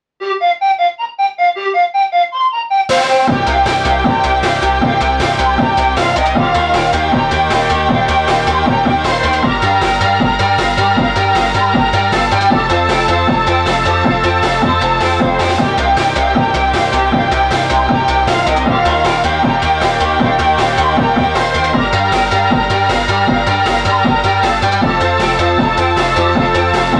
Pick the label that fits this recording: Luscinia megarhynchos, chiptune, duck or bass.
chiptune